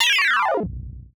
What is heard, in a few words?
Retro Arcade